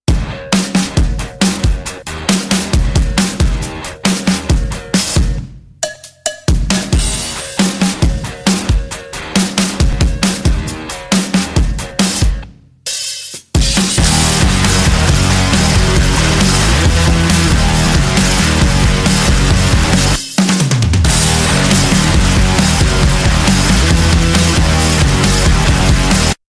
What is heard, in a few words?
Nokia Lumia